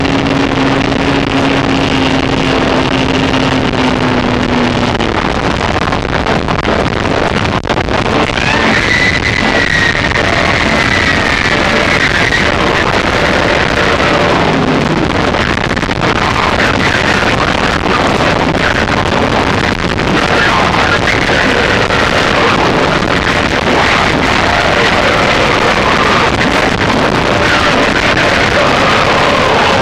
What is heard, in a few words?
cesium breathing sample